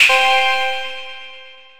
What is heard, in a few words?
unlock; anvil